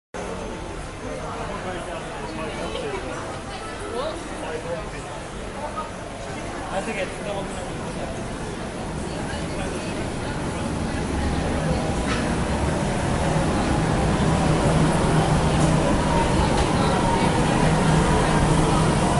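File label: at one of the metro stations in Milan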